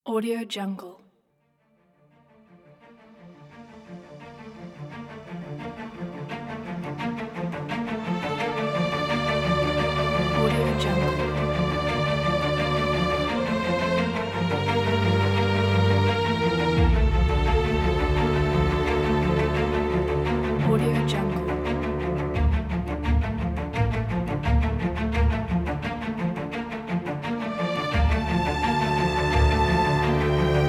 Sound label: cinematic intro